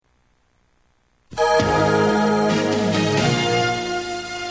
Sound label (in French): jingle pub